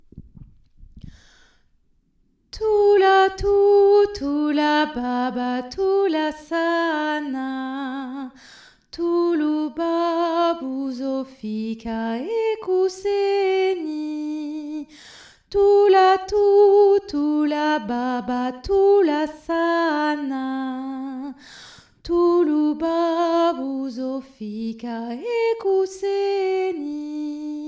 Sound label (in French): alto; thula baba